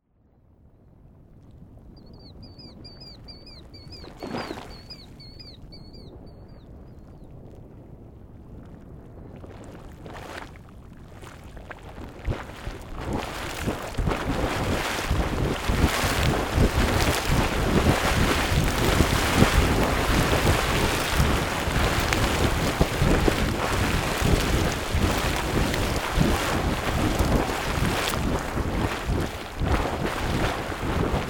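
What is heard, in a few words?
Anemone Geyser, Yellowstone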